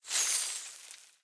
free Spin Coin Throw; robin hood

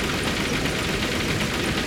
sym Mech BG; hiddencoinsofegypt

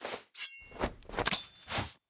symbol Knife; robin hood

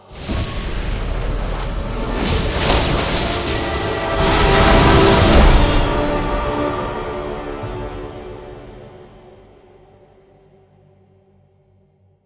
scatterWin; archangels

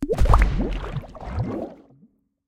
win explosion large; reef raider

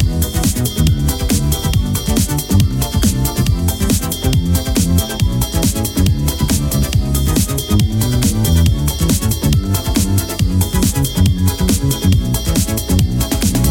cash stack music; vegas night life

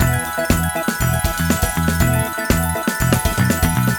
big Win Loop, slots; king of slots

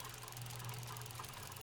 reel Spin, slots; king of slots

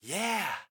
money bag appreciation; robin hood

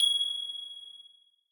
count Up Loop End; secrets of christmas

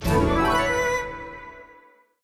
free Spin Win; robin hood

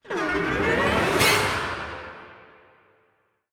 near Win Quick; codex of fortune

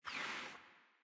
bricks Discard; cashomatic

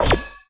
cash stack symbol appear; vegas night life

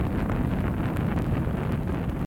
yellow flame; hiddencoinsofegypt

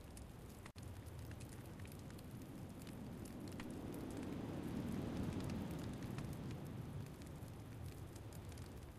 outro Free Spin Loop; lost relics

